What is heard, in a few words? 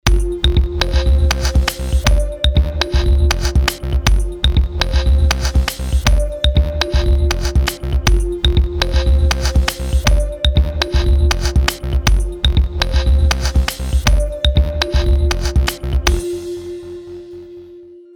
Underwater Exploration